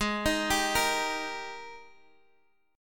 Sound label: G sharp Major 7th Suspended 2nd G sharp, down, Guitar, Standard; JGuitar